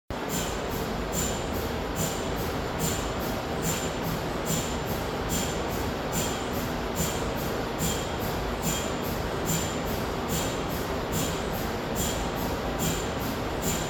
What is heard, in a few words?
Cold head pump